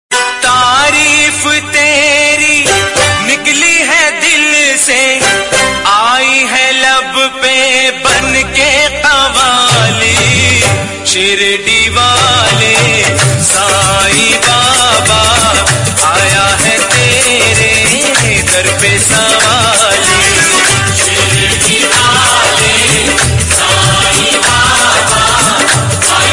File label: Notification - Message Ringtone; Sai Baba